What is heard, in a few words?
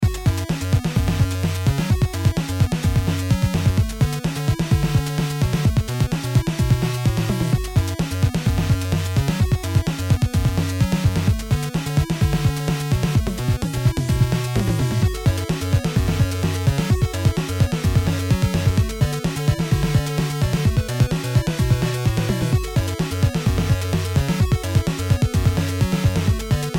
beat